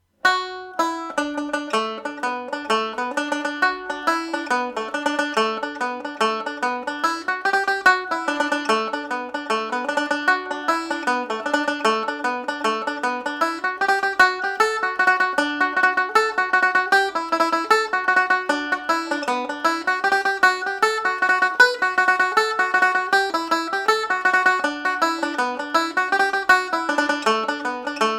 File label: with triplets, banjo; Humours of Tulla